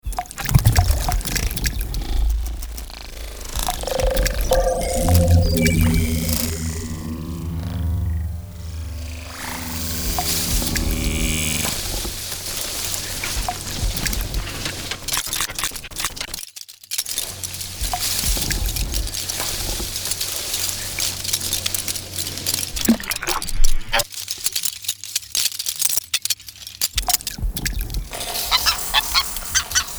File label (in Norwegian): Oya lyder mix